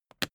briefcase plastic latch lock close; industrie wirtschaft; bueroarbeit

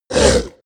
ogre attack; kreaturen

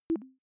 notification message ring, signale

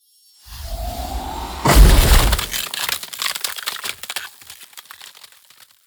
ice ball hit crackle; whooshes rises

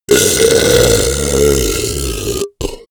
beast grunt weak; kreaturen; comedy-cartoon; soundpool

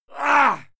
male fight attack; soundpool

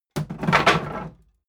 wood board drop rumble; objekte; holz

